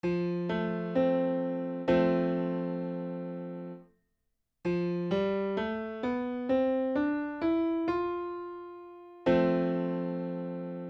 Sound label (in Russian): lidijskij zvuk; Звучание: светлое, приподнятое, «воздушное». Популярен в киномузыке.; Пример: фа–соль–ля–си–до–ре–ми–фа.; Лидийский лад